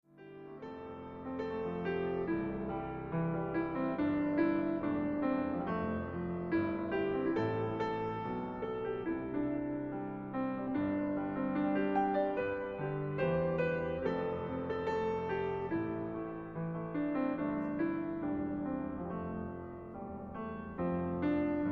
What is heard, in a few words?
Pianosolo